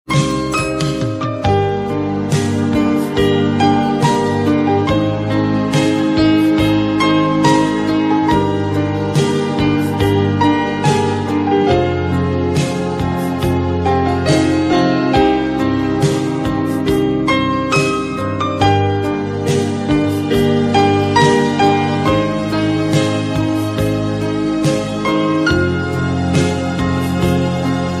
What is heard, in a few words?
cute romatic ringtone